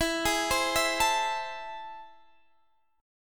A Minor Major 7th, E

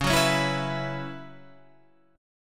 B Suspended 2nd Suspended 4th

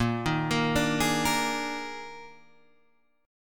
D sharp Major 7th Suspended 4th, A sharp

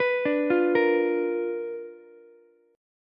B Minor Major 7th Flat 5th Ukulele; arpeggiated; JGuitar

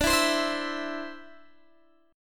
D 7th Suspended 2nd down Guitar DADGAD; D7sus2; strummed

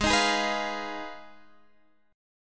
G sharp Minor 6th G sharp down

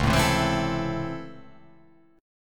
C sharp Minor Major 7th Sharp 5th C sharp, chord

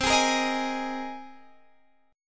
C Minor 7th Sharp 5th C down

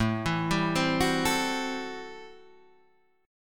A Minor 13th A Guitar Half Step Down

